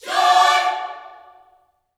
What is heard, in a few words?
JOY CHORD, Gospel, Vocal; ILIO; Vocal Planet